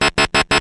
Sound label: fail update, sentry gun; cstrike; CSSB